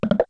wood

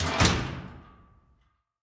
Metal Bar Doors Close; The Town

